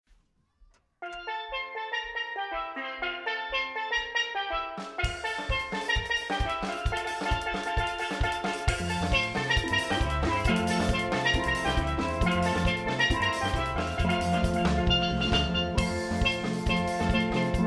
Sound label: Calypso